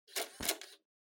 Portable Stereo Turn On

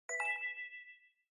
OS Sound (Speaker-Notification)

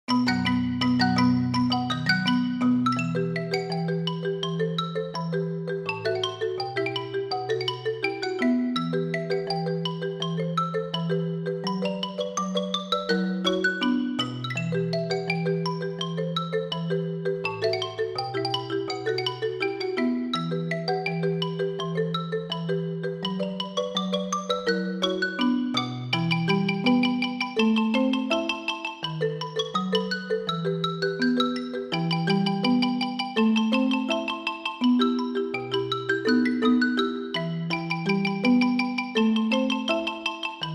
Slow; Csikos Post

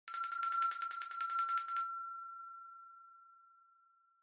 Winning Bell Strong

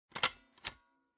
Floppy Disk Drive Eject

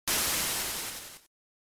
NES General Noise Single

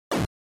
NES General Noise

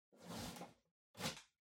Cardboard Box Far Label Peel Off; ダンボール01-11(遠い ラベル 剥がす); 効果音＞梱包材

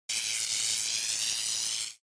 Electric Shock, Long

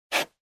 Ballpoint Pen Straight

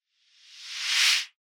Noise Sweep Mid Reverse

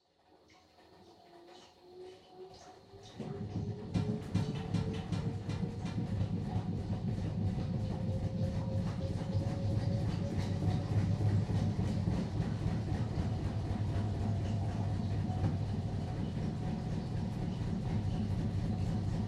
Washer Front Load Noise Far Spin

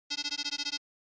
Retro Anime System Short

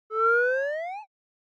Motion Slide Low

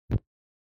Lighter Flame

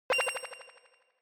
Inspiration Low Delay; Onoma

